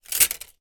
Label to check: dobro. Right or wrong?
Wrong